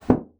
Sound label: bassoon slap; Xsample VOL-6; C BASSOON